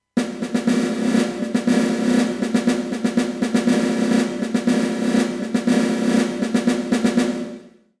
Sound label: snare